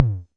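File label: mattel tom, Mattel Synsonics drum kit, lo fi; 8 BIT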